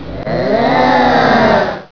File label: alien ooh